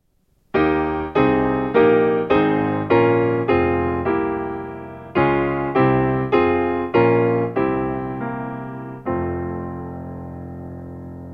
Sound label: Piano